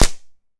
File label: Gun Paintball Shot; Weapons